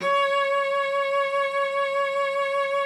C#5, cello vib; Xsample VOL-7

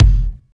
KICK, Tekno Industrial; East West

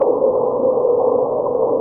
HOWLING; Rarefaction; Poke in The Ear With A Sharp Stick

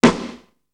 ol gate, snares; Zero-G - Funky Elements

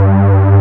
JUNO STR; E-MU Formula 4000 Series Vol. 3 – Analog Odyssey; Vintage Keys

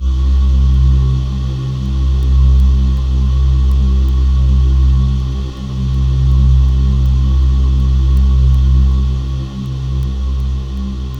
C1, Synth Leads

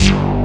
TRON BASS; Pro-Rec - Synthsonic; TRINITY

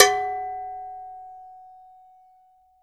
AGOGO LO, GM PERC KIT

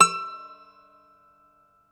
Irish Bazouki

